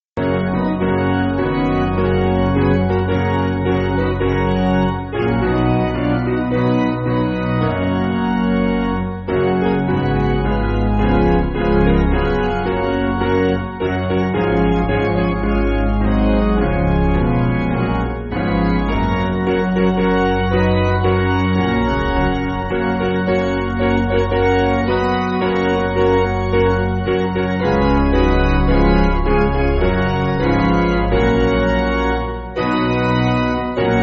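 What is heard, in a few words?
Piano, Backing Files for Churches; Basic Piano & Organ (CM)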